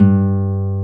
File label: G G1, NYLON; AKAI S-Series CD-ROM Sound Library VOL-1